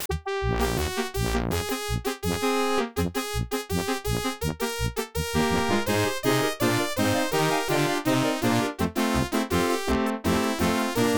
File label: Team Jingle